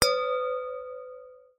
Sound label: household glasses wine crystal x2 hit together; zapsplat